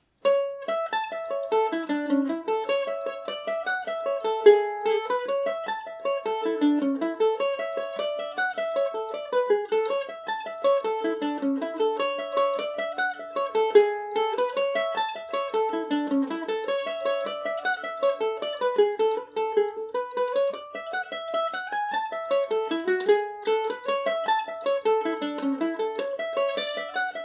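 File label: distant steeple A major, irish mandolin; G&O #34 mandolin; Listen to me play The Distant Steeple on my G&O #34 mandolin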